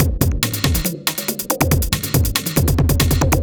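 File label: industrial 140 kit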